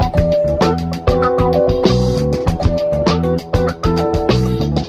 full, Loops Phonky

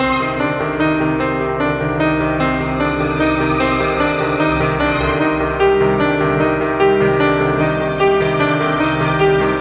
piano and strings, sad loops

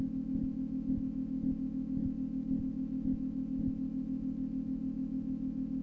drone; Rumbles

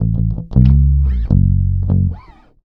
bass, Loops, Phonky

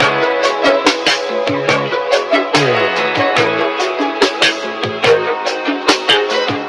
keys, Phonky

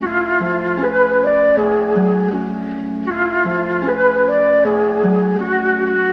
flute, Loops, Sad

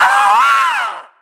"ah ah" male; Voice; ooh ahh

